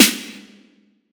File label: sizzly snare one shot g sharp key; snare drum